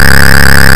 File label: shield